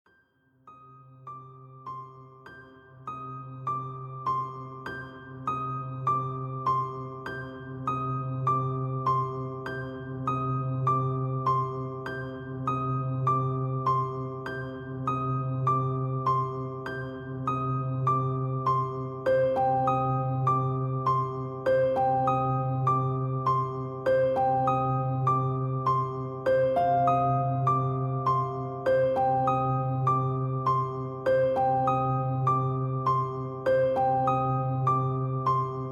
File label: dramatic piano